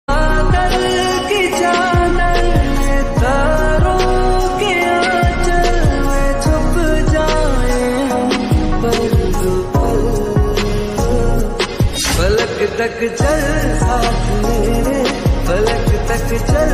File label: lofi ringtone